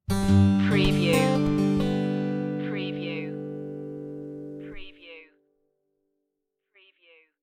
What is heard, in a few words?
sunny woods stinger